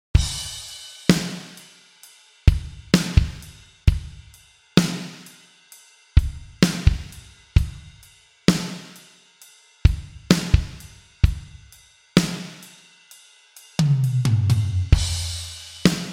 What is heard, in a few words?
ballad